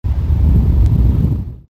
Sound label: Blowing Air